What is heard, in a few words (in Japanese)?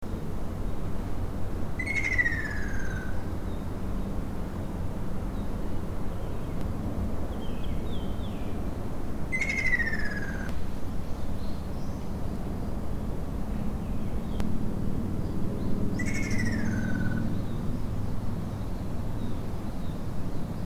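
akasyoubinn, ruddy kingfisher; jyumokukouenn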